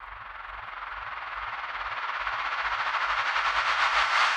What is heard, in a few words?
White Noise FX 110 BPM; Flutter